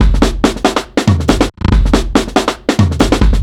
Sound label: Tech Roll 45 Fill, Drum n Bass; KJ Sawka - Drum n Bass & Beyond; Beats and Percussion